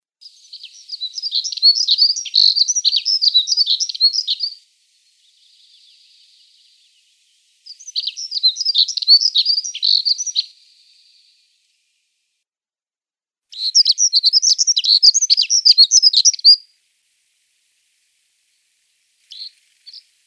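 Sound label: Siberian accentor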